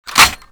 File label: rainbowgun start reload